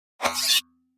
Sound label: Pop Up Spring HF Scrap, pop up element